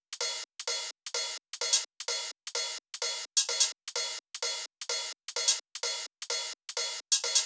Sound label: Top Drum Loop - 128 BPM; Drums; Loops; House